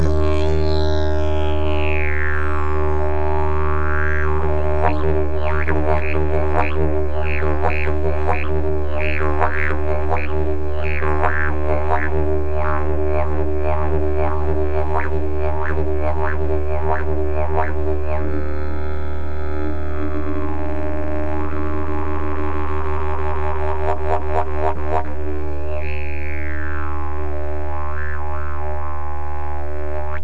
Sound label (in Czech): jilm mekkozvuk, didgeridoo; 153 x 8,5 x 4 cm; moření, řezba, olej; D - Dis; Tomáš Dufek